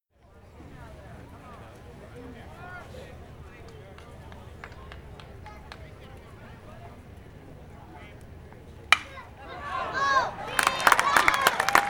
Baseball Stadium Crowd

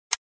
Gun clicking

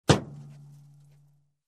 Dull Metal Hit

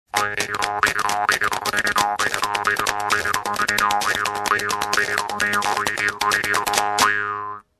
Robotic comedy

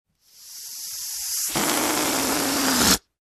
Balloon air escaping

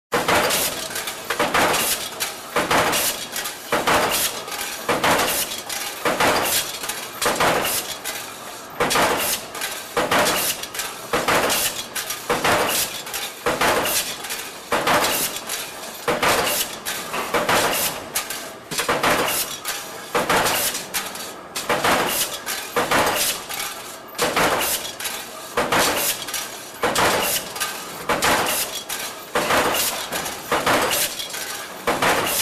Automatic Crushing Machine

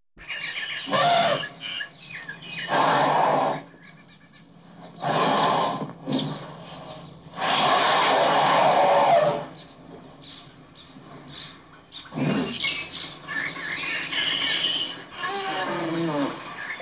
Elephant trumpet with bird ambience

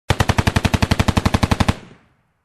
Heavy machine gun firing

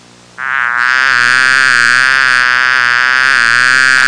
Mosquito buzzing upclose sound

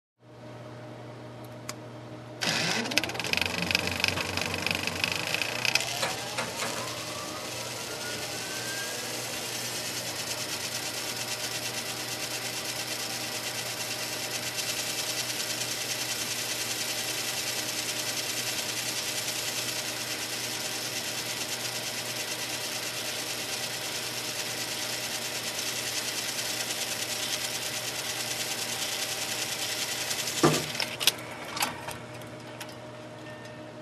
Cinema Camera Projector Running

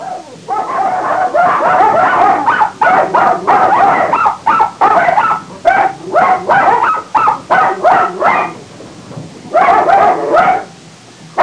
Barking dogs vintage recording

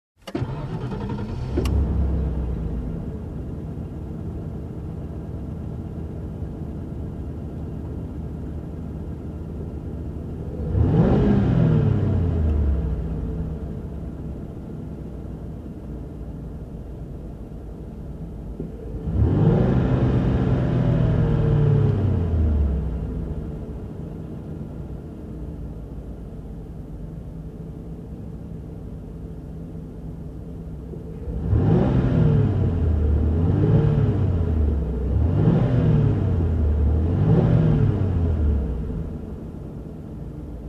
Rev up SUV engine

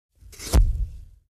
Synthetic Poof Sound effect

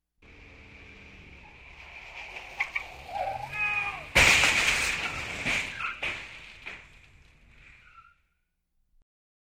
Car about to crashed with a man shouting

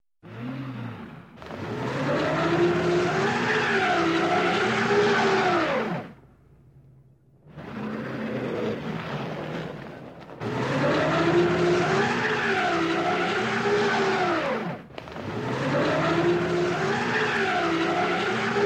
Truck stuck on a mud

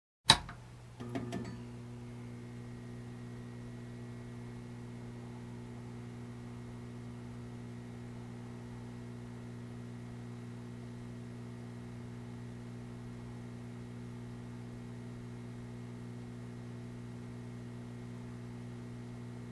Switch on and quite buzz

